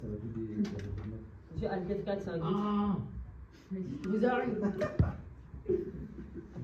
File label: aggrhhh